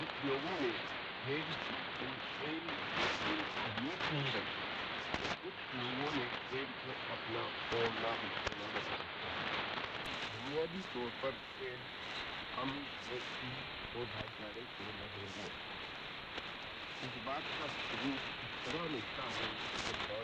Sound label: 4810khz AM; AIR Mumbai